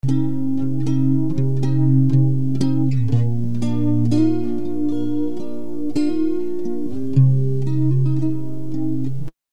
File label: flanger